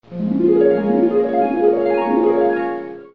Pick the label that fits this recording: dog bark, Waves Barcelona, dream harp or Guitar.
dream harp